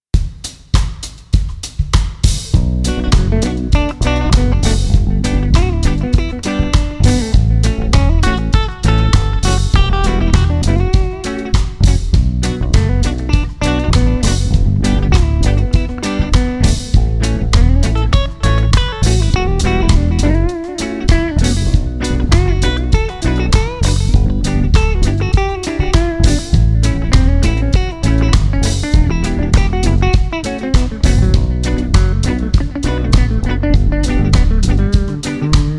aeolian

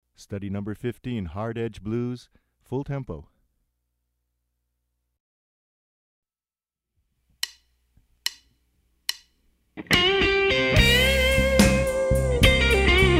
Hard Edge Blues Fast Tempo

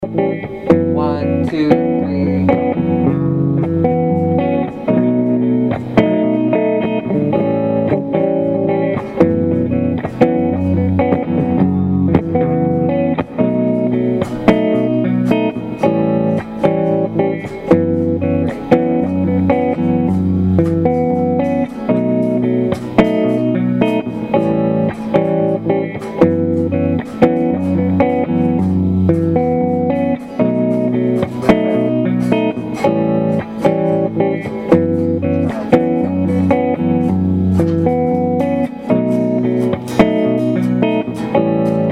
melodic minor mode II play along

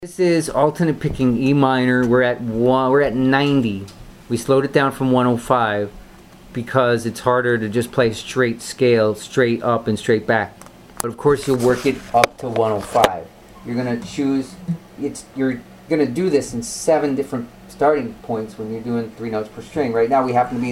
alternate picking

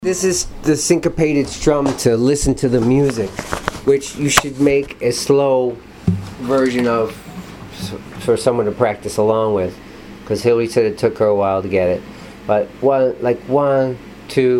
syncopated strum

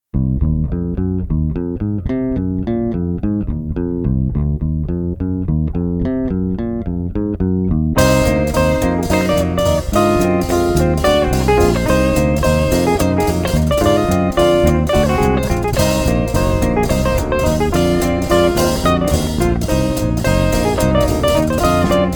locrian nat 2

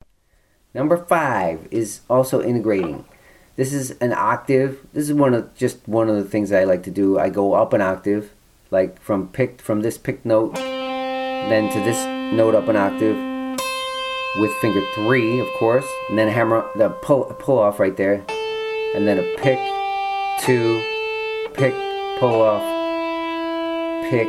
hybrid picking exercise, guitar

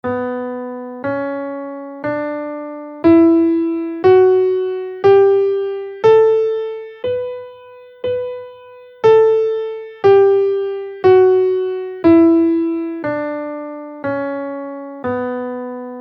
aeolian scale in Bm, guitar

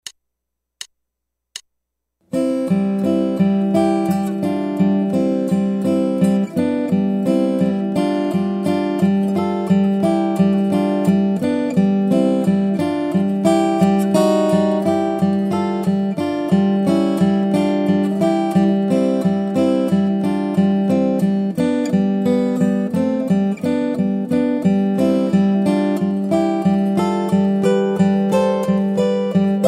Guitar

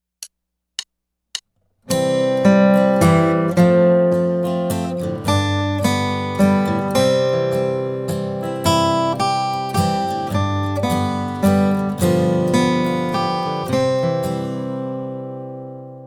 German Waltz

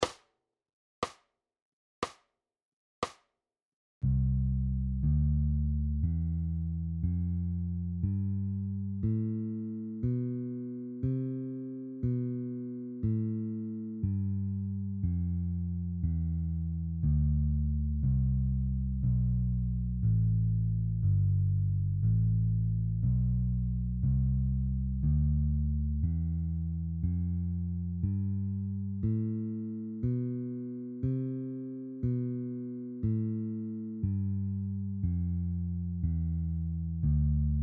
bass major scale 2nd position at 60 bpm

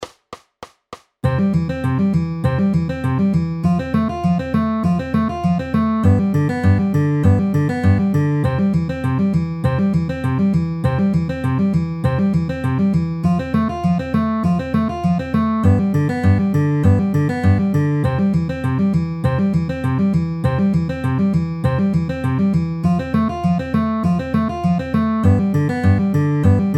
C F G C downward arpeggio at 200 bpm

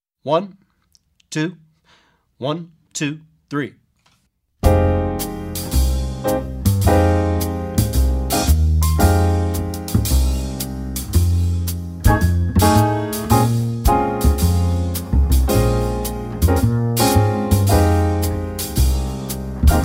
Minor Is Finer Without Soloist

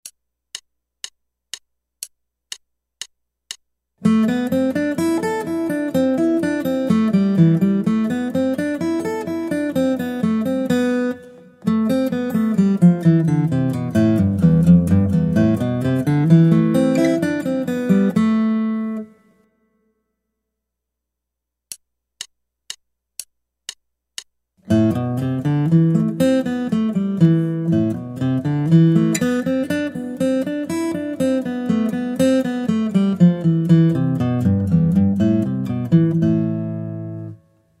Guitar

